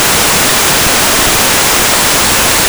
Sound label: SID noise, Analog; Xfer Records Serum; Serum Presets